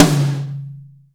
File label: XV Tom Hi, Roland XV-5080